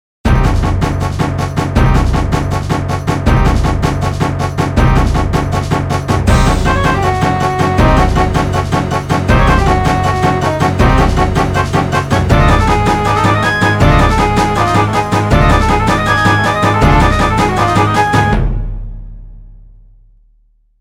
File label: Orc Victory; Warcraft 2